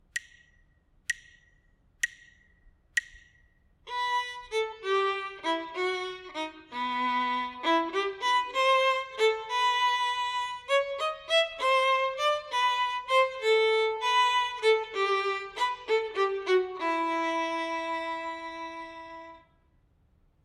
melodic dictation, partially completed melodic dictation; ear training